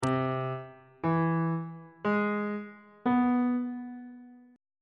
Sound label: a harmonic minor 7th chord 3 is c augmented major 7th on bass clef